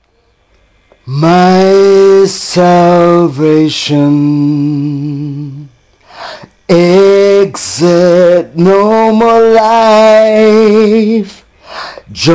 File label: me singing salvation